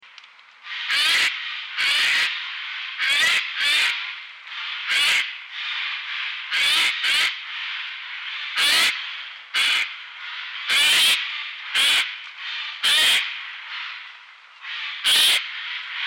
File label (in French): geai des chenes garus glandulus